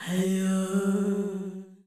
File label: Fem Vocal 128bpm G FX, African Voices